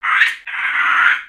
Pets Skunk Angry; creatures pets; Lego

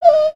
Owl Hit; Valiant; Summoner; aronwk collection; ndaudio

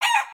cluck; creatures organic

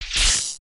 terminal shock

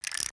Upset Turn, emotes

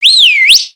tame pet whistle; emotes

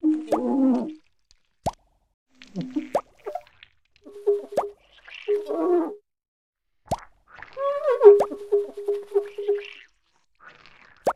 Window Washer, foley

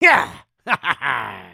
Shoot Complete; creatures organic

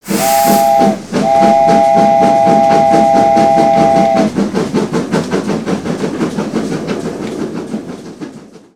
Train Booster Full; aronwk collection; ndaudio

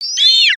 Approval, creatures pets

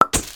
fall apart death; foley

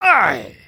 Get Hit; creatures organic

